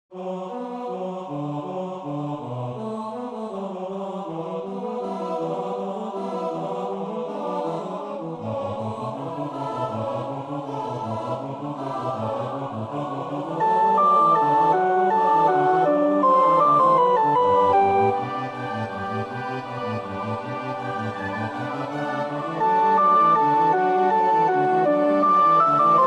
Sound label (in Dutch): koor sopraan; Bach (Johann Sebastiaan); BWV 244 Matthäus Passion; No 27b. Sind Blitze, sind Donner(koor 2)